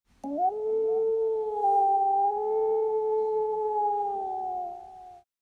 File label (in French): Loup